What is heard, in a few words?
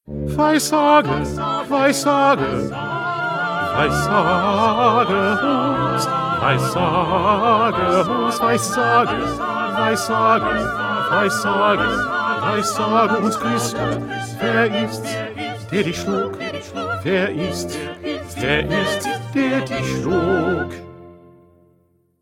1st Bass; Bach-St-Matthew-Passion-42b